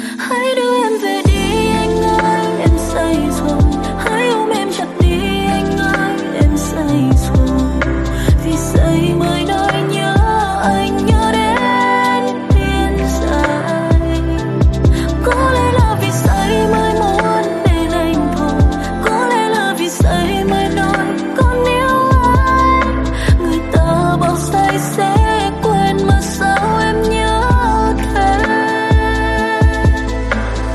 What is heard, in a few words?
Lofi